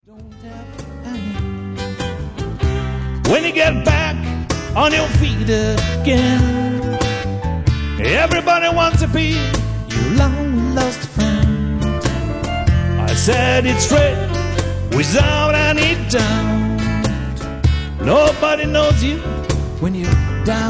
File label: Cello